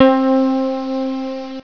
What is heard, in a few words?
EPIANO; sc2k